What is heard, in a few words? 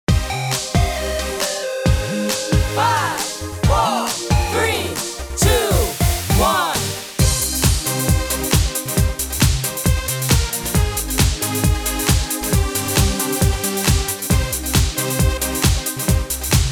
Sample Techno